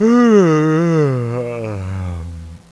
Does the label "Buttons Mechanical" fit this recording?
No